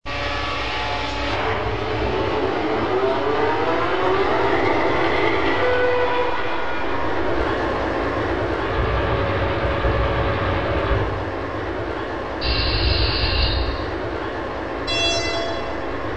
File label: 2TE116